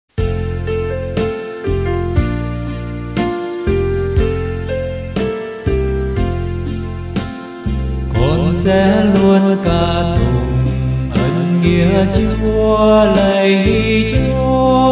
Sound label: BASS